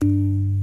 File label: 60's Organ; OMI - Universe of Sounds; EII Factory Library